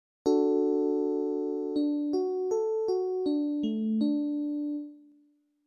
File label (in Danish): skala d